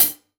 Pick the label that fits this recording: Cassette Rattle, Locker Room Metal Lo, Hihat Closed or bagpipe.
Hihat Closed